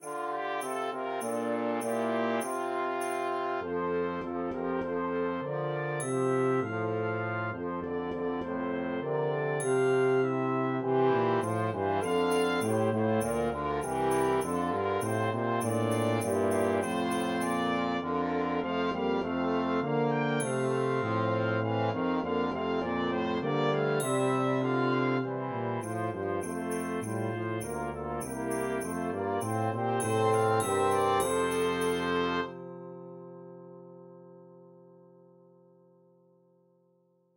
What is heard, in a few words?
Tuba Track with Melody; The Holly and the Ivy